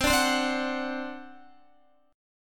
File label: C Suspended 2nd Flat 5th C down Guitar; Csus2b5 strummed